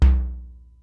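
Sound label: Simmons SDS-9 low tom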